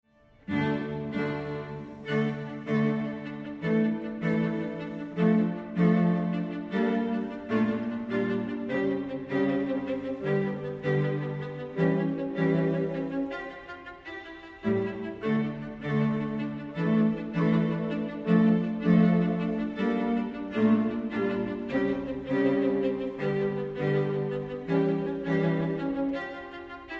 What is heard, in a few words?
String Quartet